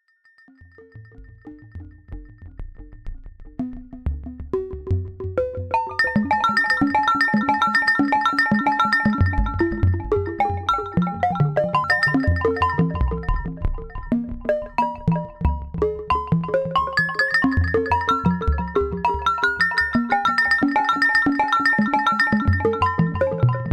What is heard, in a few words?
fm marimbas; Moe's sounds; Cyndustries